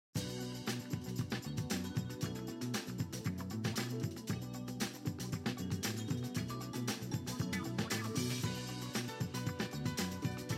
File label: Salsa Fiesta; Roblox Driving Empire Song/Radio